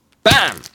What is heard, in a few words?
bam